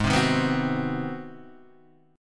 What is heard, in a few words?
G sharp 7th Sharp 9th, Guitar, Half Step Down